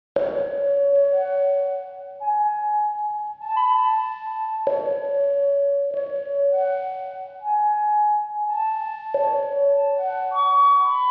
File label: SINEWAVE